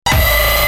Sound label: hit complex short